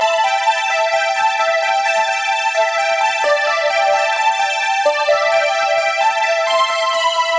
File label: 130 A Seq; Chaser; Zero-G - Future Wave